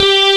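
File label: FILTER BASS; Pro-Rec - Synthsonic; TRINITY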